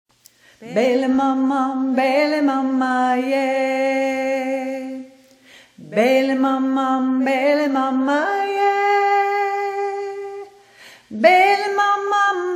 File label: mbele mama soprano, lower soprano